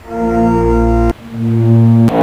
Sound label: PIPE ORGAN POSITIV; ENSONIQ